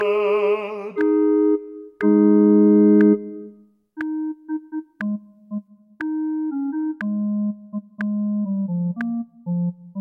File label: Rehearsal B1 B2; Gibbons